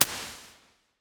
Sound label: Crystal Plate; 2 Plates; M-to-S; Load Into Fruity Convolver; flstudio